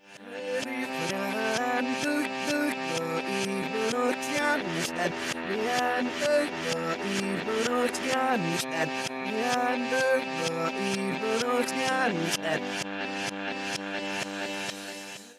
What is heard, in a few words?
Song Reversed